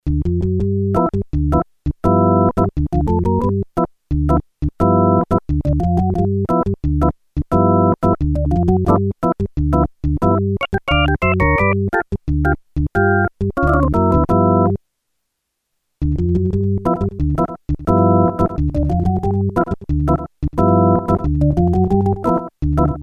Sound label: Slap Back Delay; t.c. electronics M300